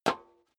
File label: step high heels metal dry single stair up; schritte